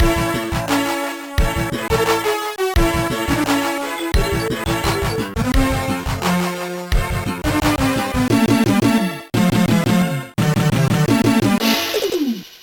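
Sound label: biing re fanfare; Soundcontrol 3.0-5.0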